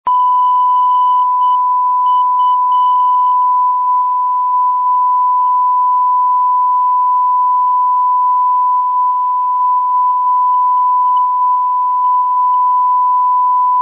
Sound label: 770khz lr neg keying